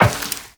Step Metal Perforated, Footsteps, Walk; Warframe; Tenno

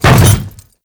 Hammer Boss Step Default, footsteps; Warframe; Grineer Hammer Boss

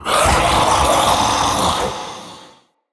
Mockery Male Vocal Attack, Enemy; Mockeries

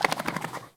Puppy Foley Idle Ear Flap; Warframe; Kubrow

